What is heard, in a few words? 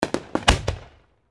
fireworks multiple shorts